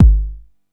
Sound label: perfect kick sample g key